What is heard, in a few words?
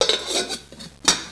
pan; Motorola MPX200